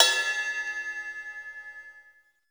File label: KOMMERZDRUMS